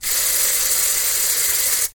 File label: spray short